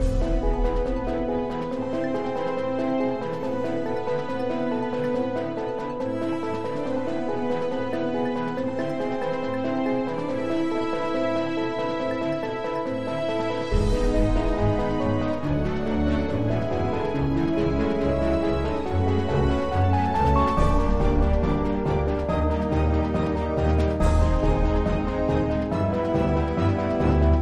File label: Galactic Mini Battle Music; dragon city